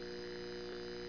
AMB

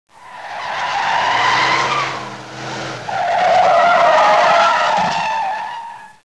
drive

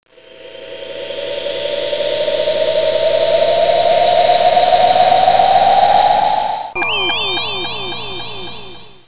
scifi

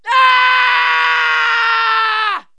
Yell of Pain, Cartoon, Yells

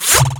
Sound Missed

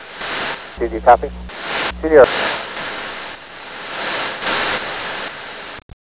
TUNE

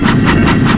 bone; CHASM

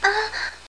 MOAN

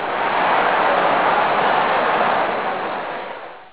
cheers

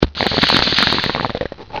pipe, items

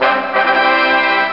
end of transfer fanfare; amircsounds

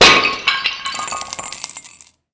gling; MOBICLIC41

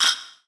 DRUM SHOT HOUSE 125; eJay; Singleshots

